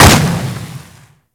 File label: Pulse Exp Impact Default; Warframe